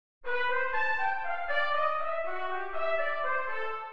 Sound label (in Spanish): Maj7 inv0 en C; Apondera